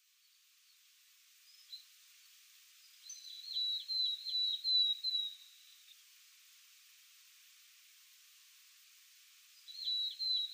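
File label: Willow Tit; koe; kogara; furano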